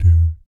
DOOT E2, Jazz; Spectrasonics; Vocal Planet; Jazz FX; JAZZ COMBO

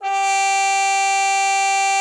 CUP MUTE, G4; Xsample VOL-3

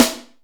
Reverb Kick Snare; PS-37 Dance Synths; Percussion

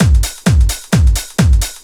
straight, Techno Trance Essentials drum loops

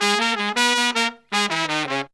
160 bpm, horns

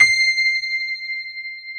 C6, PNO; Northstar - New Gold VOL-1; HLYWD PNO

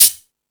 Percussion

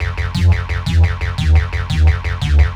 Stab Loops; neuro; Optiv & BTK

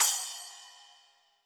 SPLSH, SPLASHES

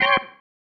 Stab, Organ, One Shots; Loops; Stabs; Sonic Foundry (Sony Creative Software); Joe Vitale Organ Donor

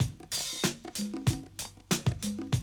Classic DrumLoops

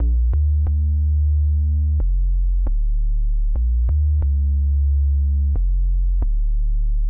Sub Riff Bb-D-Db-F; Zero-G Sound Sense Grime Scene; GS Construction Kits; The Hoodrat

